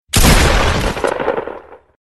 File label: pump shotgun; fortnite